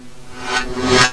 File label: scan